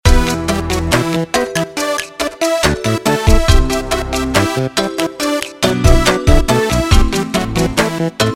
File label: Midi